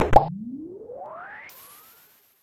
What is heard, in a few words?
upwhoosh